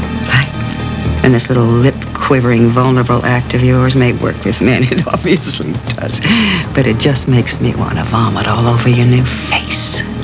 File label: vomit